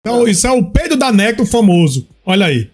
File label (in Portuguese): o peido; necton